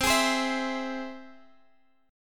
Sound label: C Augmented, guitar chord